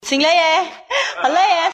tired

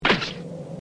walk sound; cell

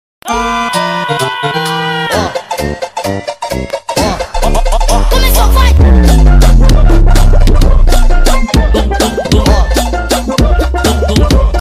funk remix; super mario world; zeba edits

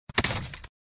armor broke

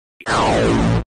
transform sound effect

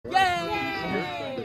yay

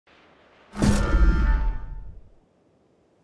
teleporter

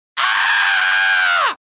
aaaaaaaaaaahhhh

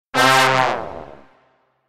wrong answer; bible games

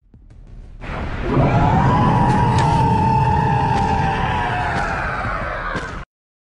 titan roar

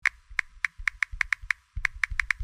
typing; whatsapp

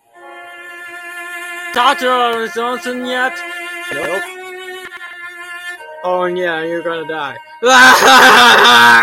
soap opera button

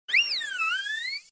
sylveon cry

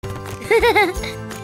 cute laugh short

